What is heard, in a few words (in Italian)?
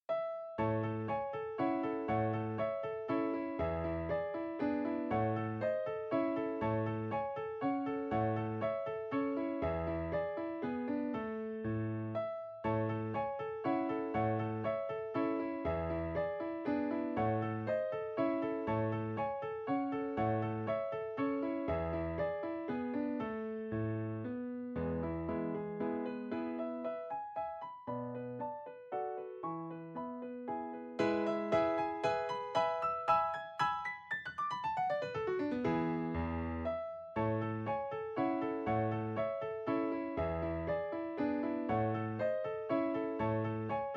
per pianoforte; Reinhold H. R.; Beethoven